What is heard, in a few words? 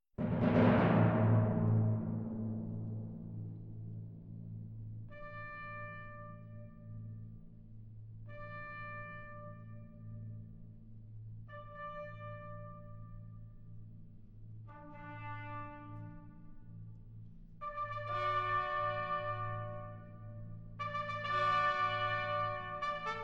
Fanfare, Wind Symphony